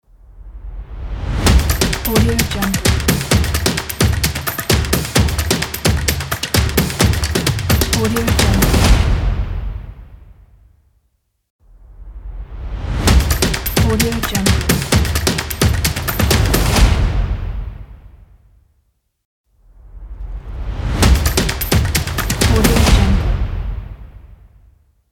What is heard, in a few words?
stomp logo